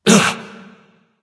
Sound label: surprise; smite; agni